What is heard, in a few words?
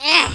fall; alienarena; invader